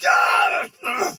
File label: Death Soldier Sword Agent Male, Battle; Dialog; Barks; Melee; Sinecure